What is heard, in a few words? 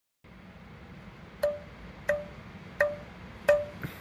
tourniquet testing 7 half turns